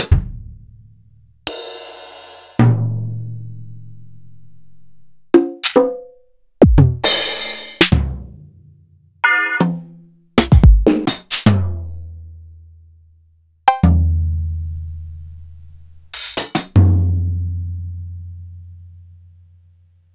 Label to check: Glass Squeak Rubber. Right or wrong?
Wrong